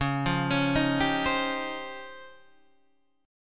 Db Major Sharp 11th, Guitar DADGAD

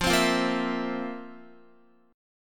F Major 7th Suspended 4th, Guitar; strummed

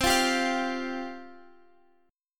C Suspended 4th C Guitar Open F; Csus4; strummed; x,x,12,12,10,12; chord shape

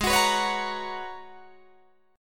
G sharp Minor 11th G sharp Guitar Drop D, chord

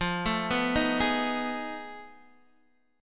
F 7th Sharp 9th Flat 5th, Guitar, Open D

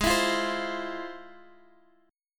F Minor Major 7th, G sharp

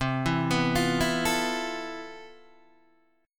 C Major 13th C, Guitar DADGAD

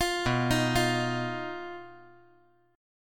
B Major Flat 5th B, chord

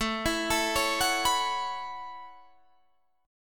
A 6th Add 9th A down Guitar Open D

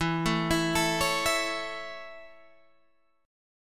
E 6th Suspended 4th

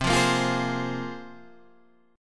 C 7th Suspended 4th Sharp 5th, Guitar, Drop D

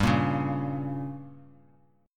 G Diminished, Bass Standard; strummed; JGuitar

